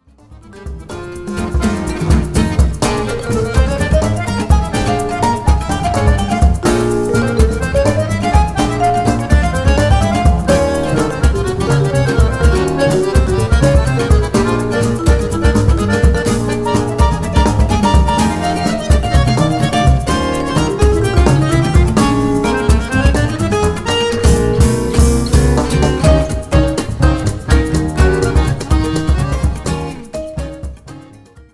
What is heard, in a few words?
Polka